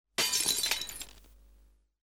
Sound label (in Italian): VETRO CHE SI ROMPE